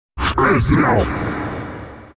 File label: satan appear